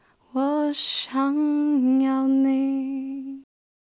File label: Alto